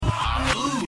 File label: HOO UGH, reverse